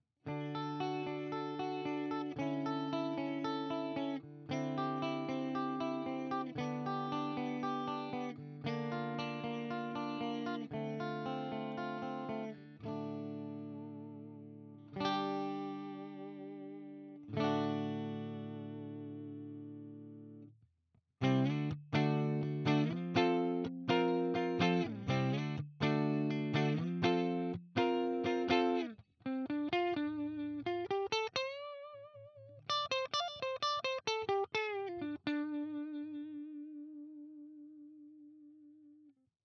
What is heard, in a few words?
Gretsch White Falcon clean both